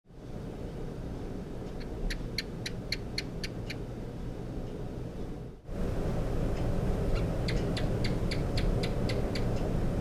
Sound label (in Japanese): hooguroyamori call